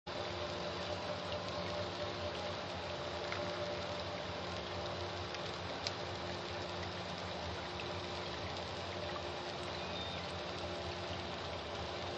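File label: calls before and after ferry; anthrophony; vessels; response to ship noise; S16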